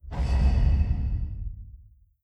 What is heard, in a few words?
distance dimensional horror tentacle attack; Stellaris; guardians